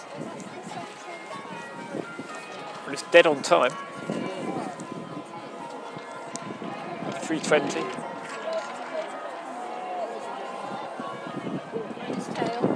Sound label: boats and bells opposite the palace of westminster